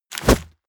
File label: medieval menu medium open, swooshes swishes